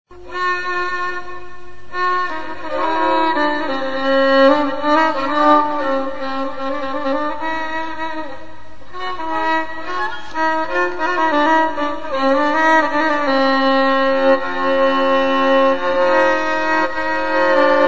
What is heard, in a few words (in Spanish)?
escala